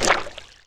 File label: hit; Gish demo